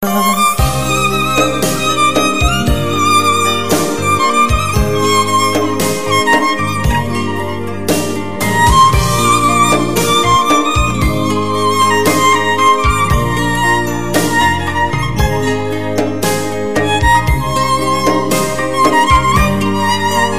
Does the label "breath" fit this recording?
No